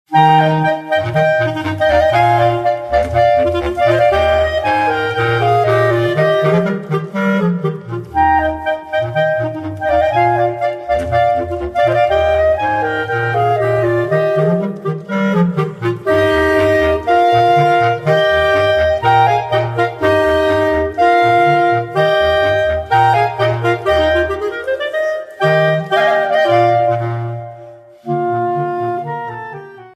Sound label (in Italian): allegro molto